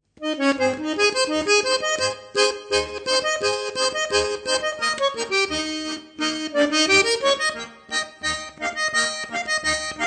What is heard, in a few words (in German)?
Klarinettenmuckel, Schwyzerörgeli